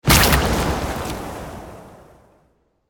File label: lunge land; smite; odin